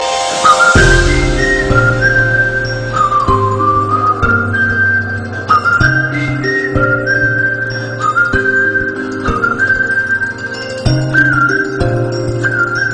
Whistle Cool

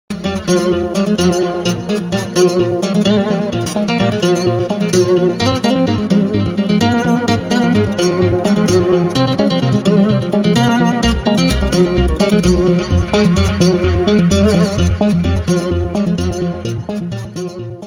Sms Guitar

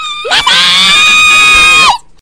Message

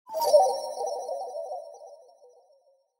Alien Sms

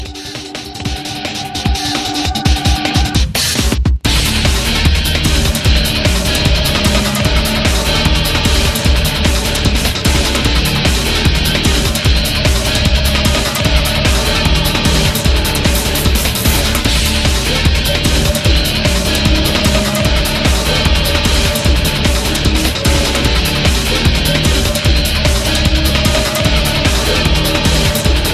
Battle Music; Dmc 4

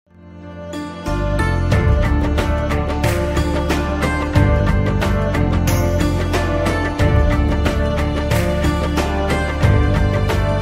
Bhangra Mix style

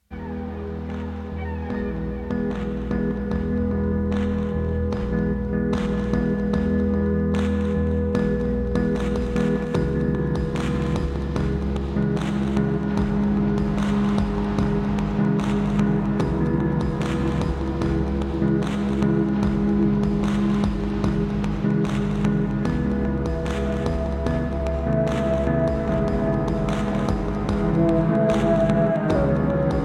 Alarmsound; The coast

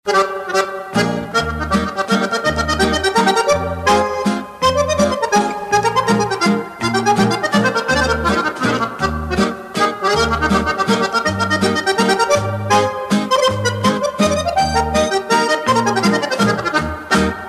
Harmonica

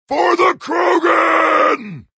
Grunt; Me3

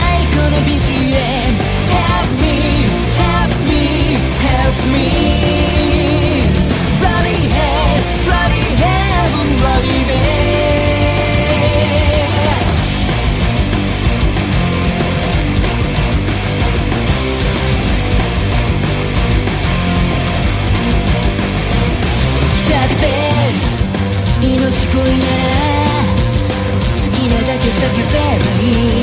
bell; Bloody Prince

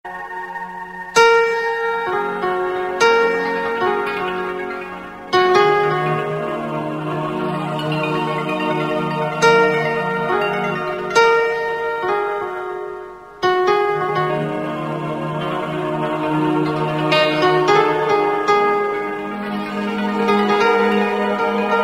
slow

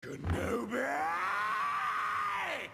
scream; Maul kenobi

